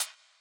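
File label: closed hi-hat; eddyflux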